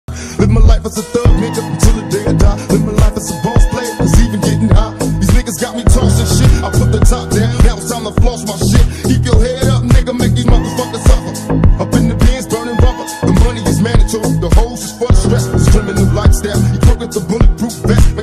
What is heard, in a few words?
Gangsta Remix ringtone